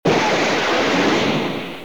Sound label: Missile